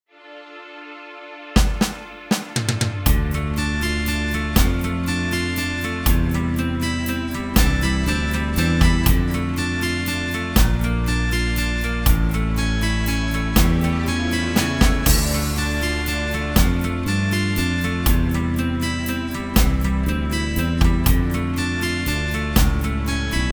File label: Folk Greensleeves backing track